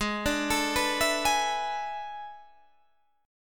A Major 7th Suspended 2nd Suspended 4th G sharp, guitar chordshape